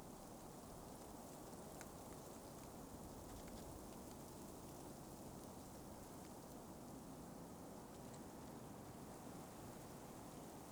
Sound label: outdoor winter; teardown; ps5 windows xbox series xs